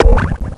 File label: jump; hydra castle labyrinth gamerip 2011